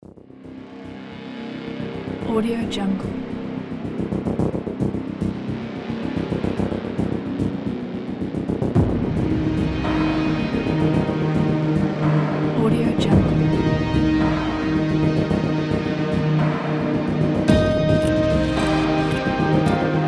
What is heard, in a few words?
the winterstorm is near